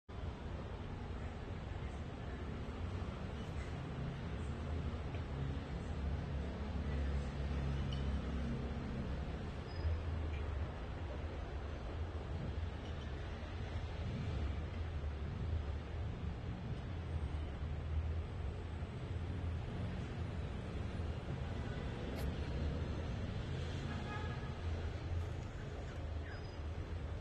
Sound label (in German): Katze passt auf